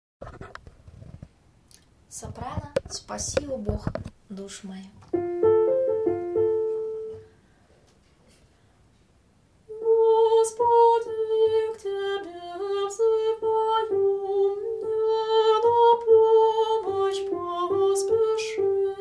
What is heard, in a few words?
soprano; spasi o Bog